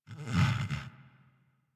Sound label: interact sleep snore, Kubrow; Enemies; Warframe; Tame